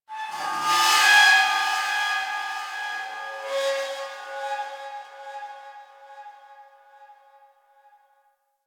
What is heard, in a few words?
Ship Metal Scrap Texture Med, Ambience; Warframe; Corpus Ship Remaster